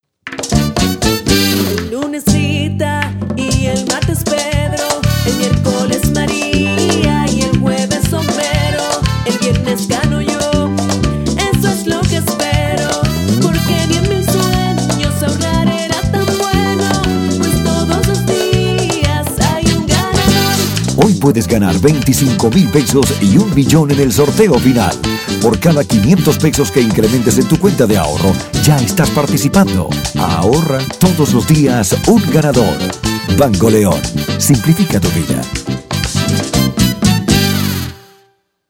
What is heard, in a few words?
merengue